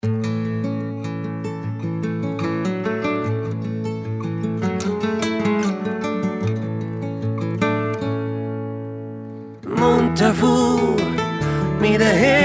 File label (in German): Klingelton Gesang; Montafon; Android